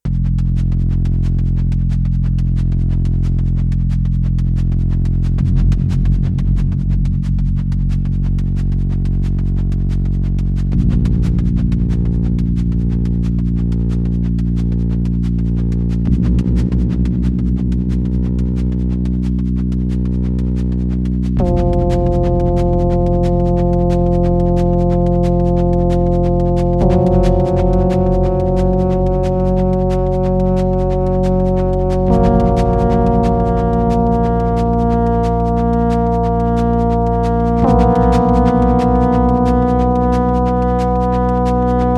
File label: Sequential; Sequential Take5